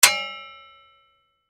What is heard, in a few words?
Sword Strike Impact Hit Ring; Warfare; Combat